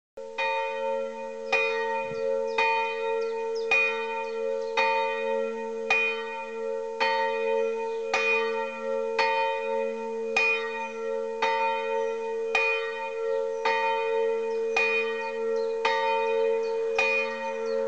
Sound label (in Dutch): klokken maasdam, het geluid van de kerkklok; Dorpskerken in de Hoeksche Waard